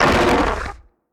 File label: HAMMERHEAD damage; Hammerhead gold